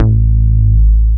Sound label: bass attack, techno trance; Ueberschall; Techno Trance Essentials